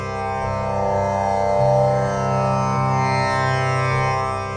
tambura loop; World Music

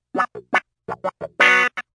clav; Instruments

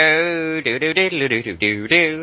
swingy silly vox loop, male singing

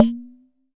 Jomox MBrane, drum machines; Jomox MBrane 11

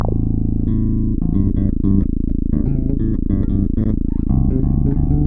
091 drone plus bassline riff

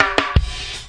compressed fill, drum; FILLS and ROLLS

